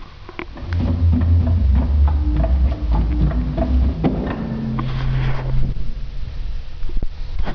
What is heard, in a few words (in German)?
Subbass 16; Vitus